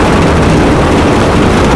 grass skid, Tires; Kart; AOS RF1 Kart Demo

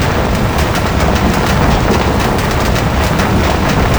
grass roll in, Kart Tires; AOS RF1 Kart Demo

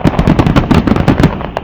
Splutter int, kart

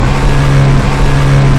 in CMED; France; AOS F1 2022

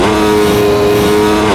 in Cmax, F1; AOS F1 2022

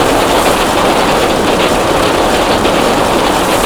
gravel skid; AOS RF1 Kart Demo

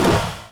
gear 2 shift down in, Kart

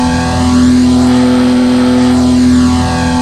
IN ON VERY LOW, F1; AOS F1 2022; FSR2018

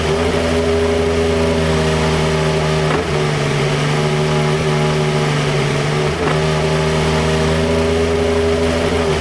RedBull in idle; AOS F1 2022

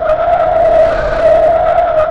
road skid int, Kart Tires; AOS RF1 Kart Demo

